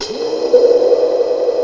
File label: CRASHEY; East West - Global Sounds; SPATIAL